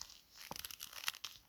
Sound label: Leather Gloves Clench Fist